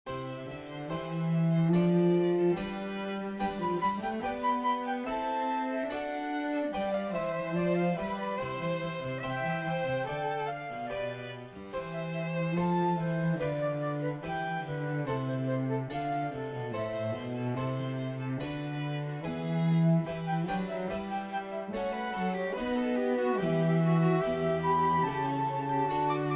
Trio sonate C Vivace

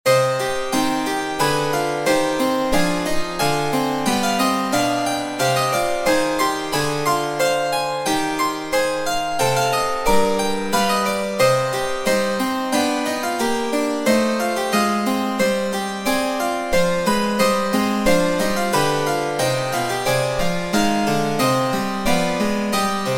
Three voice Canon Cancrizans in C minor

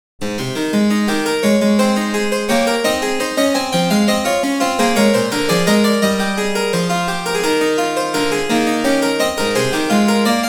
fugue a major

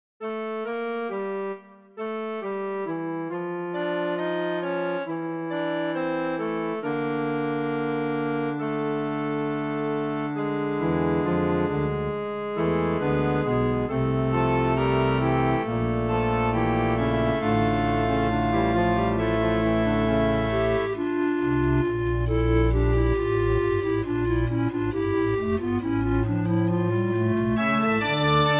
Short Fugue pedal version; Short Fugue Challenge

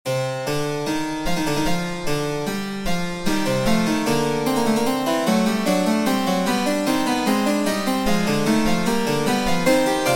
Fugue in C minor

